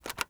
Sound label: button; transmission; raylib